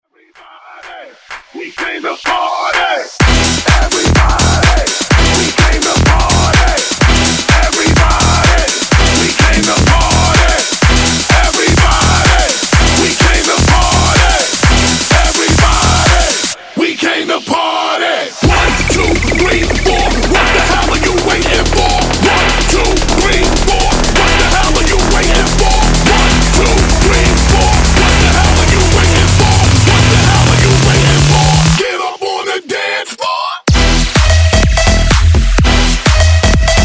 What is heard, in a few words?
Clappella Intro Breakz Outro 126 Bpm